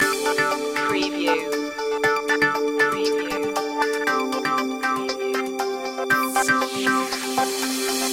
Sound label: informative board loop